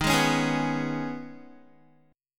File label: Eb 7th Suspended 4th Sharp 5th, Guitar; Eb7sus4#5; strummed; 11,11,11,13,11,x; JGuitar